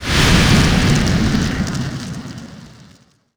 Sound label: burnup; Halfpipe